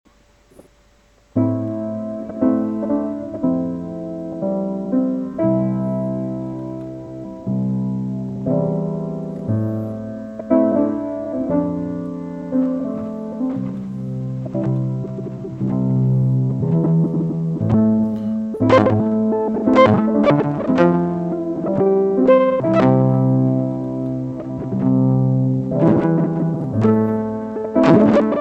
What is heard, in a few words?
piano